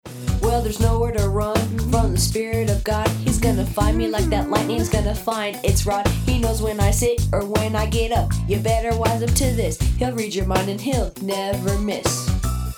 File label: Psalm 139 Rap